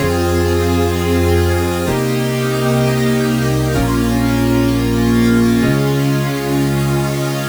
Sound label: Special Sounds Root F# 128 BPM; Vengeance Samples; VEH3 Special Sounds